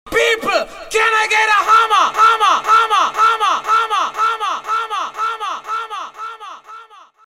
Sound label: Hamma Jingle